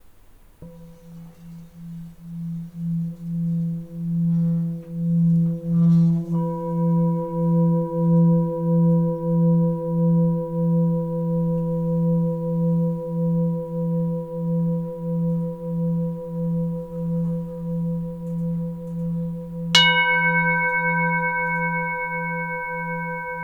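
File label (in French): thick bol mi, bols chantants tibétains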